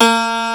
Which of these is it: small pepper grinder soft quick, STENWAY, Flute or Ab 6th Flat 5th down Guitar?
STENWAY